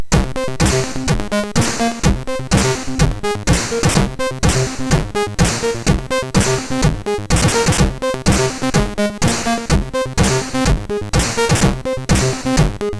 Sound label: Perverted Ringmodulation, MOS6581R3